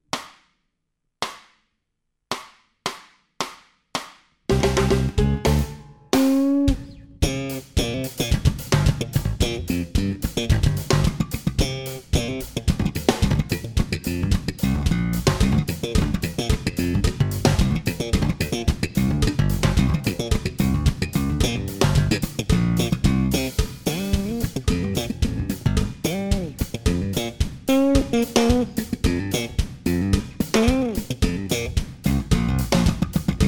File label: Bass Solo Fast Base Basso